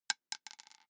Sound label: gun bullet drop